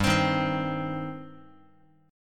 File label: G Suspended 4th Sharp 5th, Guitar; Gsus4#5 strummed; JGuitar